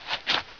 Pullup; Player; Heretic II Demo

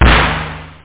SR-16 Tight Snare; Amiga-CD 1996 #5-6; sampler; drums

